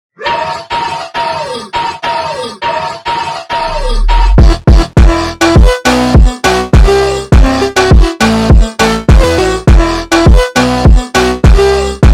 Raaah Funk super Slowed

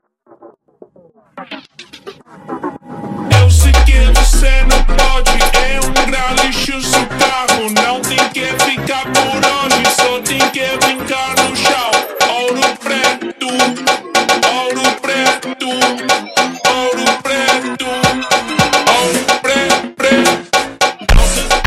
Funk slowed

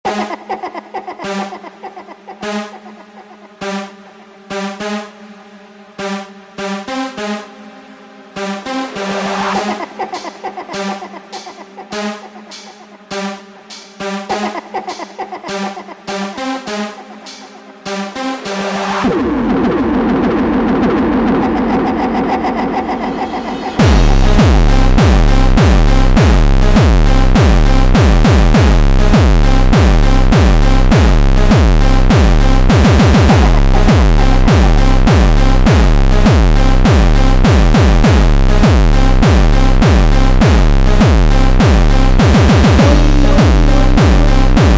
jumpstyle ultra slowed

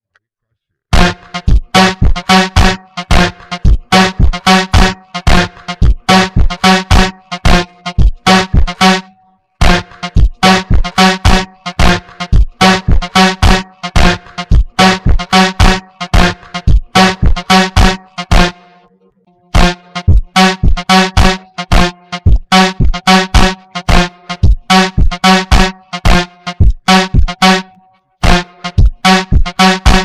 Full Instrumental + Slowed + Beat Principal Solo

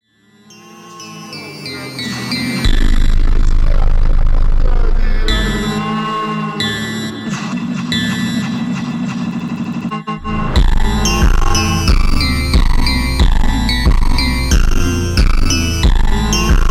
Funk ultra Slowed

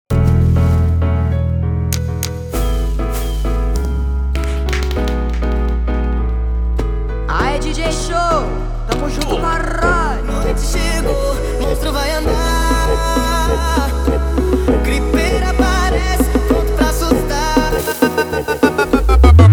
Funk sped Up

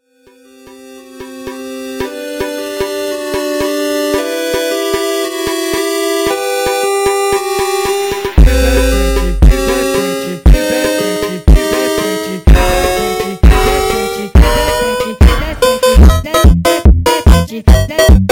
Funk sped Up

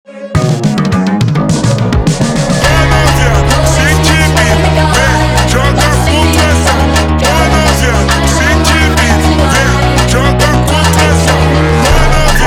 Dark Age Funk slowed

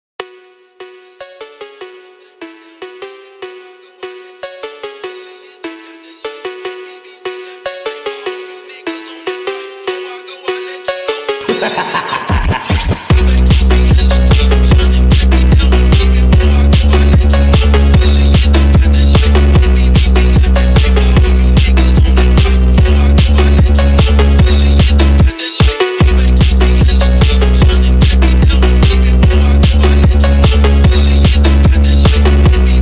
Phonk Sped Up

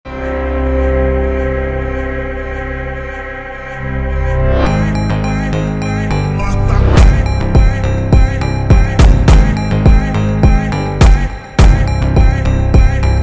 slowed Reverb

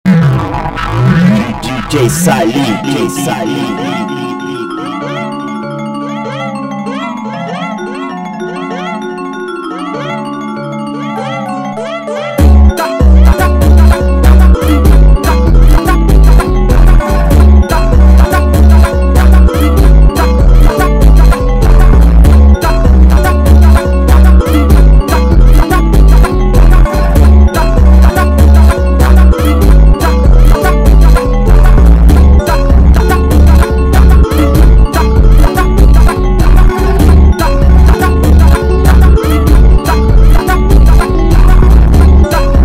FUNK slowed reverb

